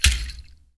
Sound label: HAND DRM, ANKLE PODS; Spectrasonics; Heart of Africa